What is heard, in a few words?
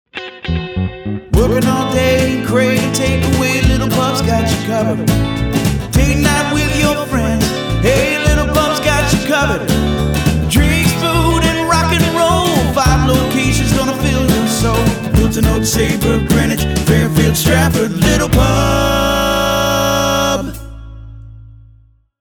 Jingle, Train